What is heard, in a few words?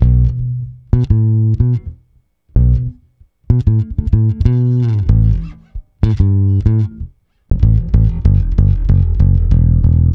Bass B Funk